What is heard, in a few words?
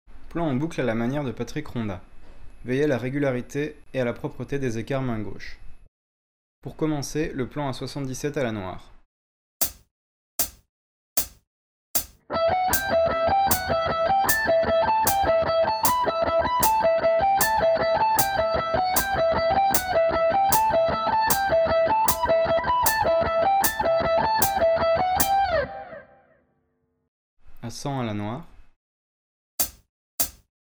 lick